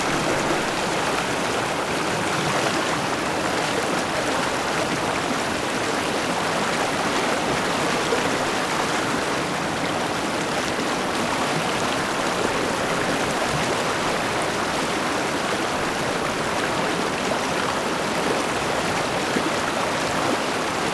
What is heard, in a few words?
River Close; field-recordings; Hidden Place; grenivik